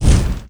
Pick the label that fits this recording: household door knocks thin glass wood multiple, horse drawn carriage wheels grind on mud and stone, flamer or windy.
flamer